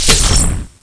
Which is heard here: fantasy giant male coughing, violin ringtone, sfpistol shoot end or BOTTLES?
sfpistol shoot end